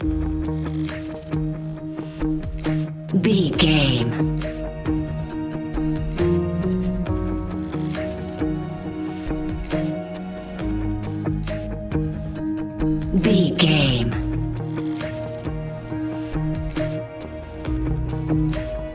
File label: investigation frequency tense reality tv underscore